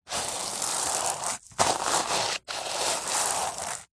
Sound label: household color changing reversible sequin cushion rub; zapsplat